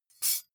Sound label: midsize metal thin clank drop